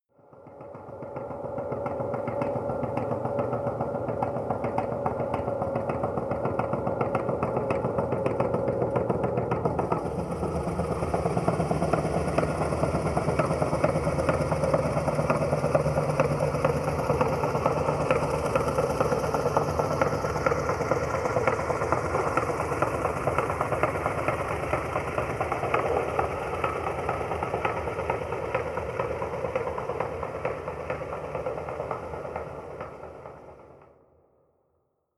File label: atmosphere underground stream, Horror